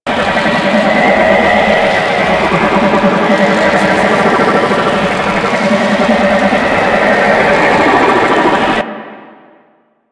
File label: Infested Magnetic Orb Loop; Ambience; Props; Warframe; Corpus Infested